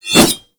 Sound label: Tonfa Whoosh; Warframe; Tenno